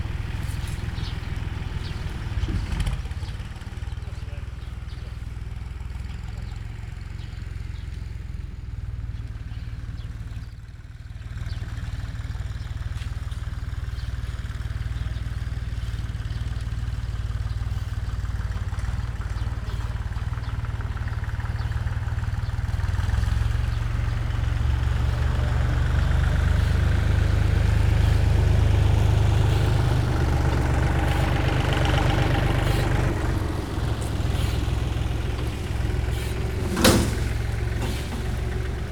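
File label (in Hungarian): kistraktor pótkocsival, traktor; perkupa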